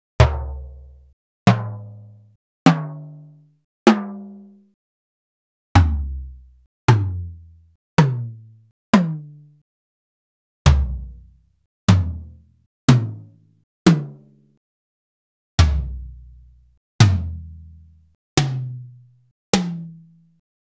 R8 Tom; X-Static Goldmine

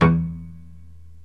PITZ PIANO

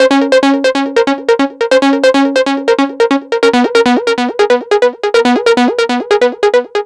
NRG Lead, trance

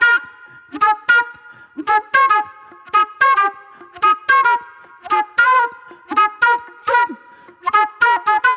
Raw Funk Flute

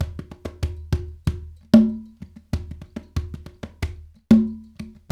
World Dance; Spectrasonics - Supreme Beats

